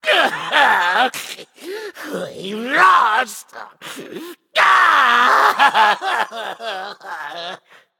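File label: non-verbal boohoo; smite; voicepack; jingwei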